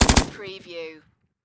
Machine Gun PKM

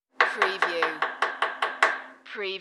Chef Cutting

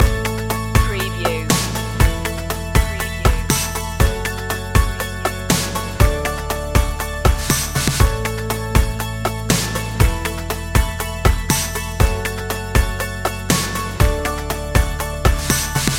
sunshine loop

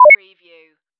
pro beep

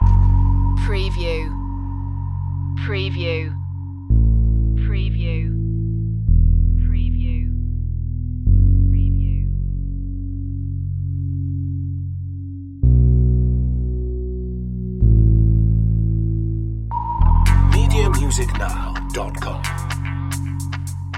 80s cop funk loop

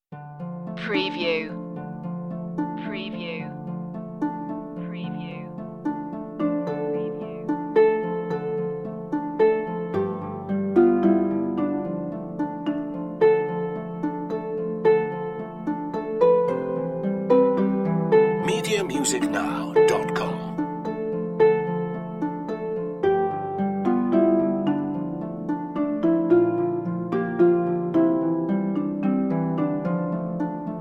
medieval no bowed no melody

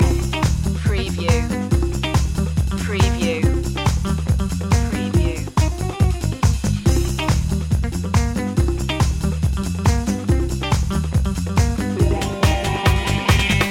leslie jumping loop

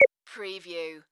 Multimedia Beep

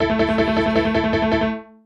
Casino Slot Machine Honkypiano

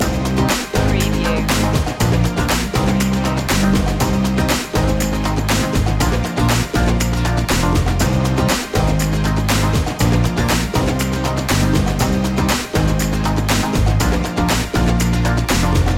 flowing rhythm loop

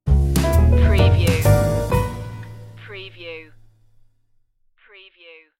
swinging life stinger

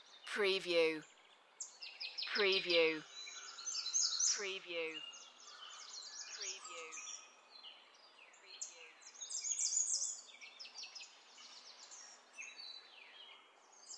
Nature Birds Morning Loop

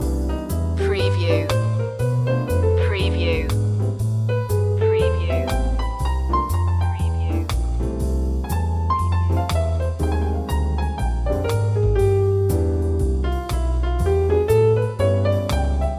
coctail groove loop

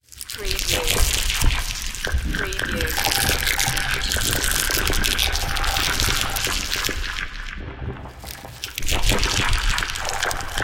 alien insect swarm

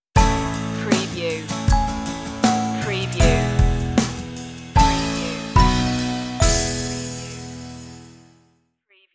toddling toys stinger

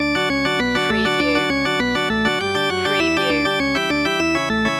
slapstick loop

